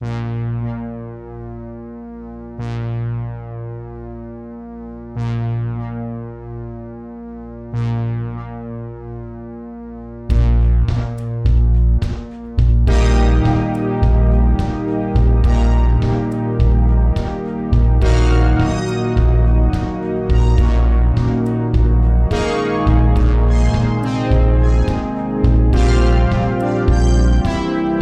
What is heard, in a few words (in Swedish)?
orgelpunkt